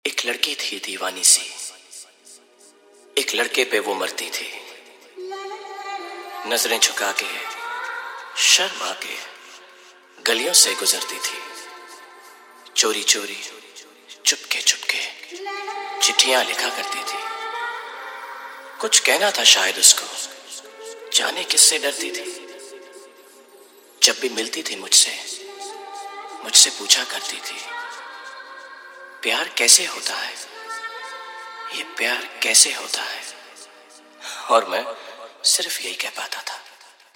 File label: Full Hard Bass Dance Mix; Markpur